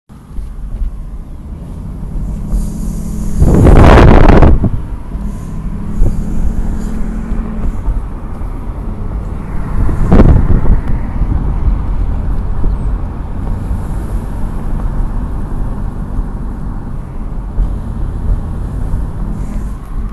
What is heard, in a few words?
squealing when accelerating turning